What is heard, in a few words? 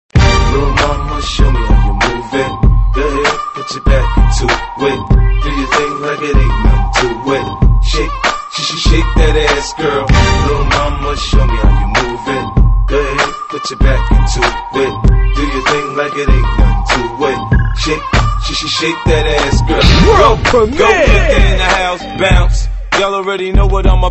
disco